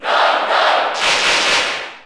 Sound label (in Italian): Cheer Italian; Roy Koopa; SSB4; SSBU